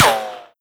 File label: npc armor, bullet impacts; physics; Half Life Model Pack